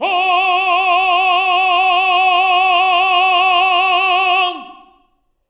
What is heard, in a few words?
TENOR F F#4; Spectrasonics; Symphony of Voices